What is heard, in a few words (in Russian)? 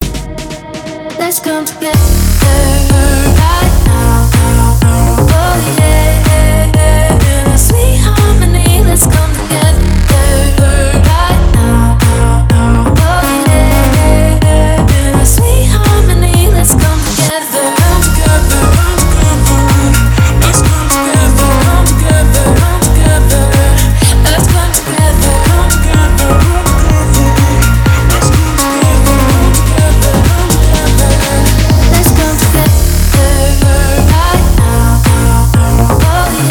Отбивка